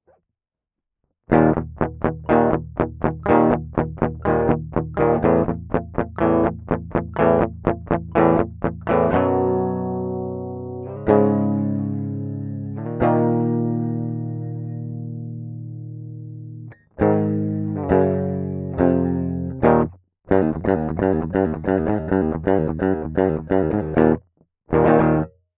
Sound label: DiMarzio vibrato